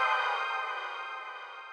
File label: Stabz